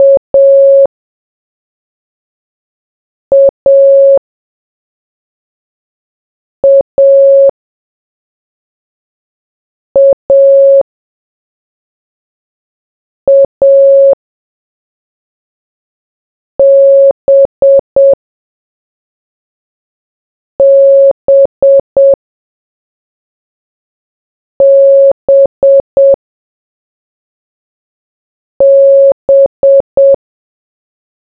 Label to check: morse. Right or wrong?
Right